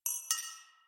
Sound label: drop